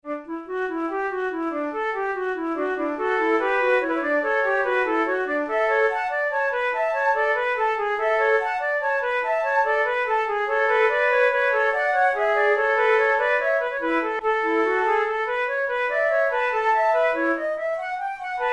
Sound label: in d major, flute